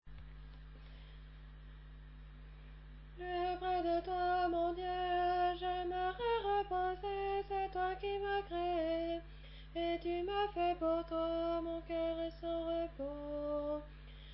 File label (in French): Voix d'alto; plus près de toi